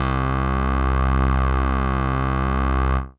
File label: Synth C, Synth Prophet One Shots; Electronisounds